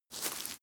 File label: step grass step; schritte; menschen